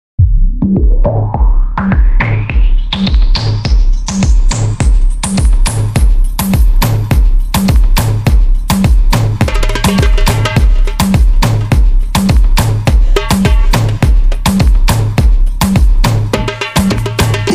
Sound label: Samba Dance